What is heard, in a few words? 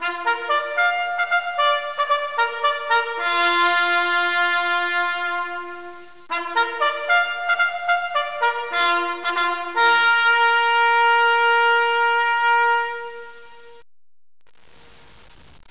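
trumpet; cdromtoday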